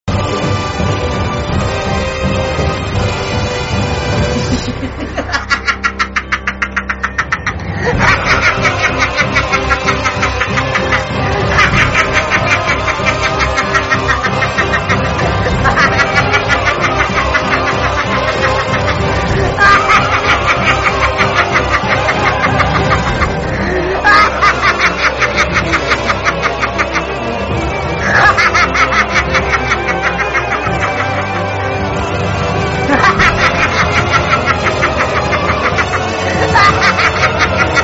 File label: success evil laugh